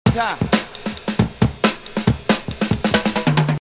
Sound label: funky soul shake